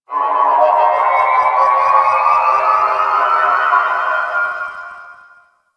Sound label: Corpus Ship Exterior Space Drones, Ambience; Warframe; Corpus Ship Remaster